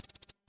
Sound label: slider tick